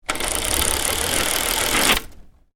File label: Roman blind down, Roman Blind: Domestic, Roll Down With Cord; Household; Miscellaneous Household Sound Effects; Miscellaneous Household (Sound Effects:Household); Stuart Duffield